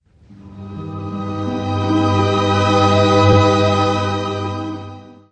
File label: pretty string swell; Orchestral